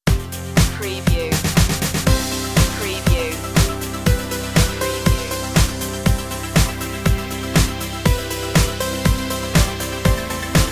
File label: gliding waves